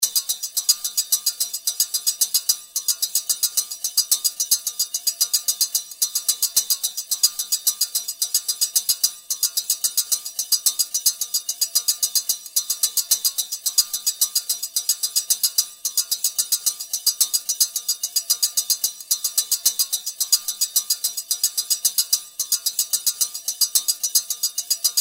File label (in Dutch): klopper loop